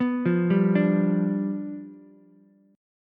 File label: G 7th G down Ukulele; G7 arpeggiated; JGuitar